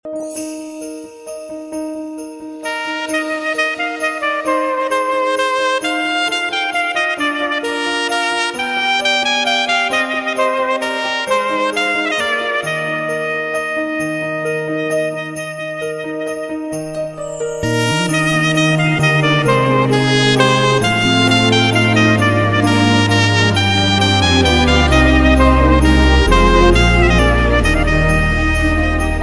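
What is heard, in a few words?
Christmas melody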